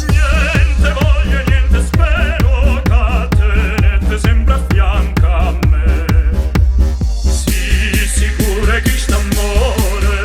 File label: remix